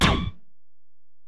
Tom, Alesis DM-PRO, Drum Machines, Toms

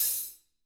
HTRA LOOS, Drums, TAMA; ILIO; Double Platinum Drums 1; TAMA+TAMA

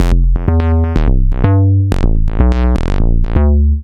Slo Bass; Sonic Foundry (Sony Creative Software); Underground UK House & Electro

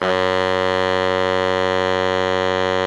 BARI MF F#1, saxophones; Greytsounds - Philip Wolfe David Crozier's Saxophones; BARITONE

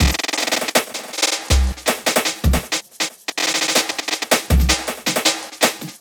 Beat, Futurist Drum & Bass; Drums & Percussion

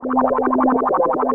FX, SYNTH FX; AKAI S-Series CD-ROM Sound Library VOL-6

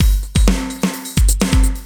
CB LOOP; Zero-G; Chemical Beats

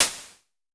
SN Basic, Vermona Mono15 Drums Snare

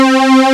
DIGI WAVE, DIGI PAD; AKAI S6000 CD-ROM - Volume 1; SAMPLE WAVE MIXING 2

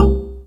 native perc; Greytsounds; Scott Peers Sound Engineering

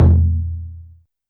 Taiko Drum, YAMAHA RM1X

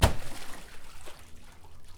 water slap

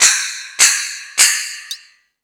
House Machine, Drum Machines

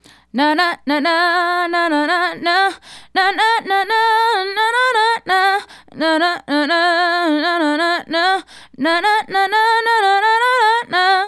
Female Solo, Hip-Hop and RnB Vocals

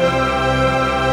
orchestra D3, orchestra hit; Greytsounds VOL-1

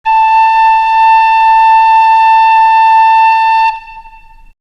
Wind; AMG; Brass n Wind

